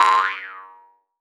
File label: JUMP